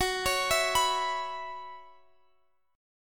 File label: F sharp 7th Suspended 4th, Guitar, Half Step Down